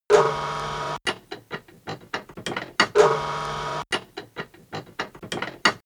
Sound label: industry machine mechanic electric